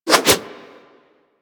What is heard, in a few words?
Axe Small Spin Swing; Warframe; Weapons